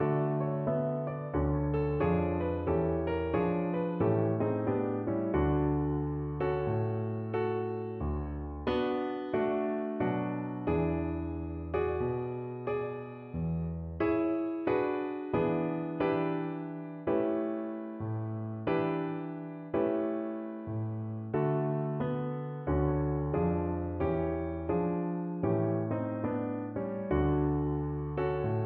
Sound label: cello